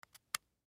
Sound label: Beard Trimmer, Beard Trimmer Switch Off Electric Razor; Barber Salon Appliance; Household; Miscellaneous Electronic; Blastwave